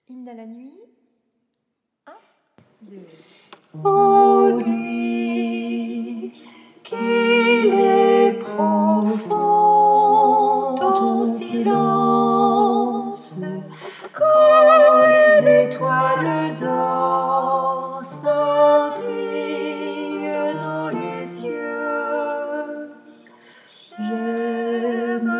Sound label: tenor; hymne a la nuit